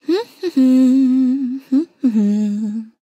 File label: Nonverbal Hum; Aurora